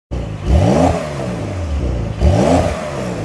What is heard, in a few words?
porsche dakar; carface